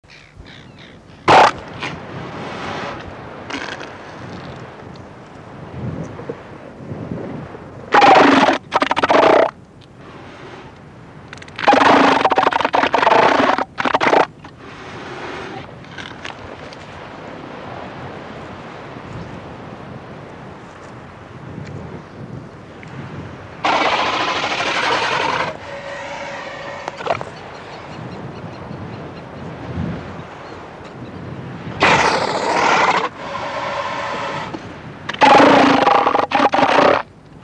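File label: Southern elephant seal male pulsed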